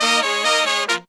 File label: Phantom Horns, 132BPM; 90 s Sample CDs; Zero-G